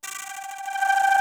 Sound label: FLATTER, ALIVE INSTR; Soundengine - CSR-000 The Art of Transwaves